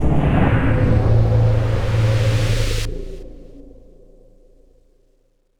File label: Combo Sting